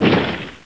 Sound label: ballista hit ground; Caesar III